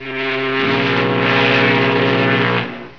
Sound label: ships horn; BSA Sea Scouts and Explorers